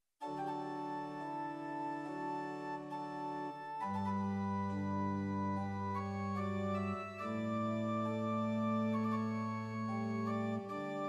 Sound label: Short Organ Music Pitch 440 Temperament Equal; Prinz 8 4; hymn